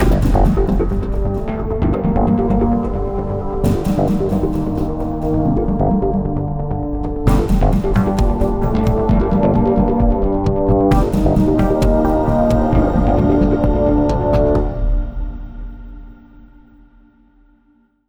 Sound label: Ticket Seaside Startup; Global Agenda